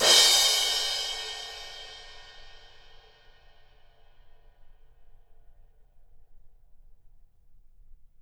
Drums Percussion Mixed Mic

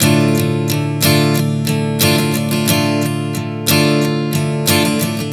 Strum 090 Dm, One Chord Strumming 090 bpm; Acoustic Guitar

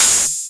Open HH, Cymbals

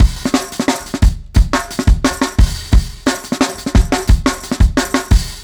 Rough Beat 4 Bar, Drum n Bass; KJ Sawka; Drum n Bass & Beyond

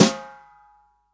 SWING SNARE CHROMATIC, Real Drum Kit; REAL DRUMS

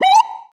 Sci-Fi Lasers - Droid Talk; Antidote Advent

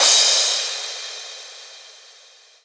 Crash, Cymbals

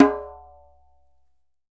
DJEMBE, African Drum

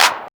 claps, techno; Techno ID; single-sounds; single-drums

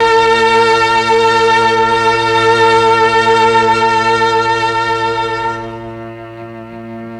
MEL STR, Legendary M400

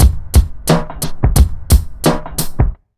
BEAT, Underground Hip Hop

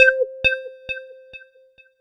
C3, ANALOG SEQ; Roland JV 2080

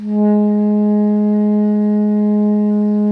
SOP PP G#2, Saxophones; Greytsounds; Philip Wolfe David Crozier's Saxophones

